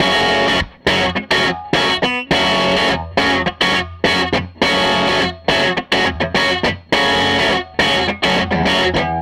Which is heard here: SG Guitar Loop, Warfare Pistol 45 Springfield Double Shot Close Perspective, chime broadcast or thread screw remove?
SG Guitar Loop